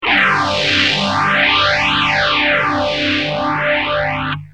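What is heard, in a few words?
guitar phase filter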